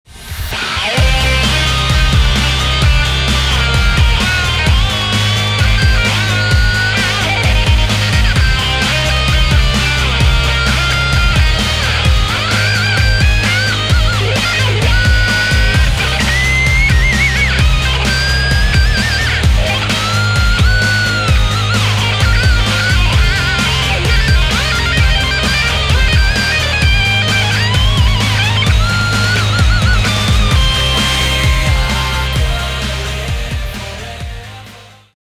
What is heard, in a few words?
Solo